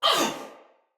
Pain, Soldier Female; Sinecure Soldier Female A

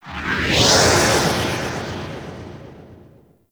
Exterior Solar Winds; Ambience; Warframe; Corpus Railjack

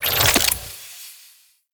Quad Sniper Zoom In; Warframe; Weapons; Tenno

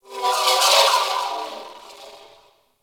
Ship Metallic Data; Warframe; Corpus Ship Remaster

